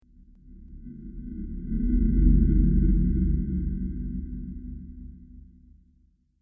Deep Space Motion, Science Fiction Sound Effects

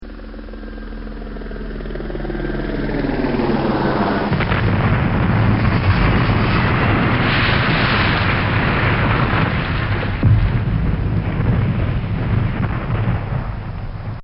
Helicopter Crash; Helicopters; Vehicles; Bobby Cole

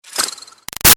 Arrow By Whirly Fast; Bows and Arrows; Combat

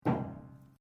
RUBBER ON METAL SMALL; Materials

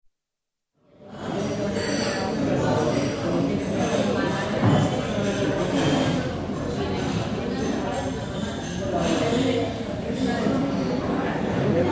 Spain: Busy hotel dining-room during evening; Buildings; Hotels; BBC

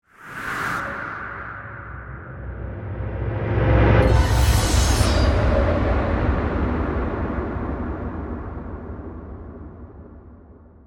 Ghost Pass By, Manifestation, Appearance; Horror; Ghosts

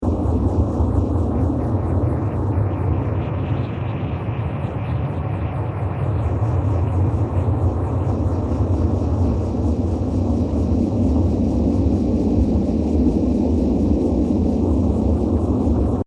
Stormy Science Fiction Ambience 120bpm; Crafted Signals

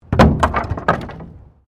Wood Fall

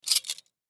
Handcuffs Pick Up; Police; Clink; Emergency

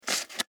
Office Envelope Manila Top Close Clasp Shut; Paper Sound Effects; Paper (Sound Effects:Offices); SFX Bible 6

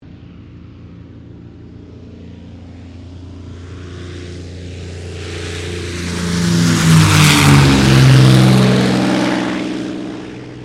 AIRCRAFT CAC CA-18 MUSTANG MK23 TAKEOFF; CA-18 /P-51 Mustang; Airplanes (Sound Effects:Vehicles)

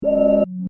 Electronic Alarm Loop, Scifi Style; Alarms; Electronics

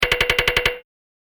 A Series Of Clicks

